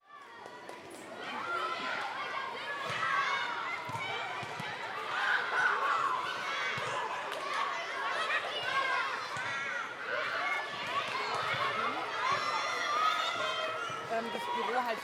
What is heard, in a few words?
Ambience, School; City Sounds